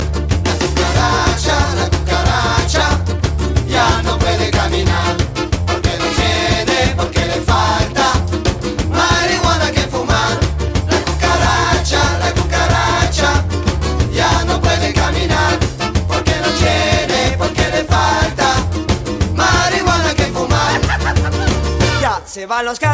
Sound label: Cucaracha Ska